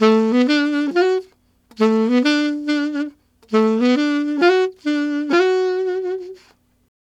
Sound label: Alto County in D maj, Sax; Sonic Foundry (Sony Creative Software)